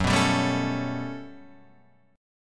G Major Sharp 11th F sharp, chord

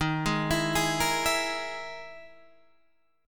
E Major 7th Flat 5th chord

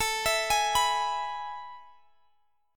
A Major 7th Suspended 2nd, Guitar, Standard; arpeggiated; capo 3

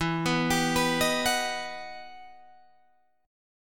E Minor Major 9th E down Guitar Drop D

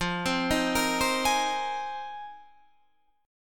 G sharp 6th Add 9th F

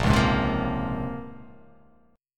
A sharp Minor 9th A sharp; strummed; 6,4,6,5,5,5,x,x; JGuitar

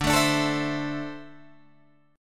D 7th Suspended 2nd down Guitar DADGAD; D7sus2; strummed

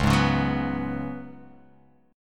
C sharp 7th Suspended 4th C sharp

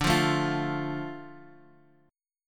D Minor, Guitar, Half Step Down

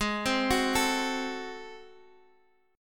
A flat 7th Flat 9th A flat, chord; Ab7b9; x,x,x,x,8,7,8,6; arpeggiated; chordshape; JGuitar

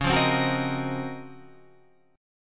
Db Major 13th, Guitar Half Step Down

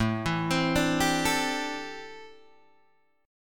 A Minor 11th A Guitar Open G

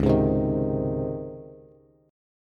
Db Major 7th Suspended 2nd, Bass; 9,11,10,8; strummed; chord shape; JGuitar

